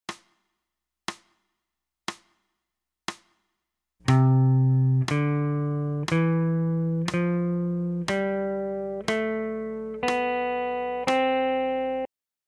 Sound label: c major up; scales